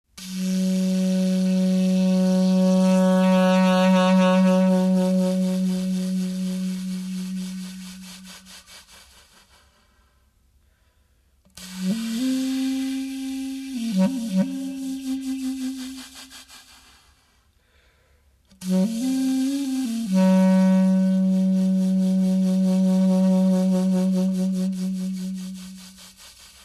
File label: Blues